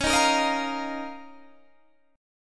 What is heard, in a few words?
F 7th Suspended 4th Sharp 5th Db